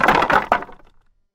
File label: demolish